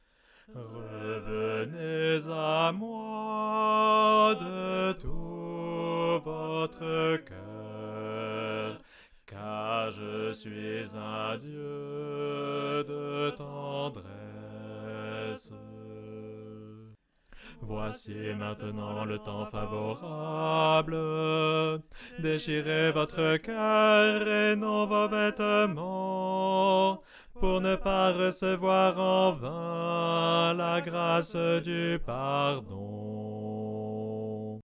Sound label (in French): ensemble B; Basse